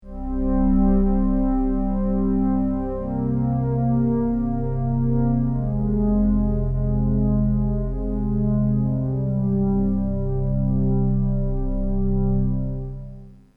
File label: memorymoog pad